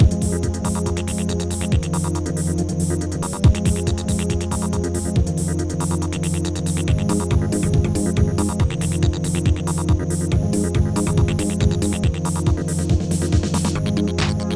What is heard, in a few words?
teckbeat; MED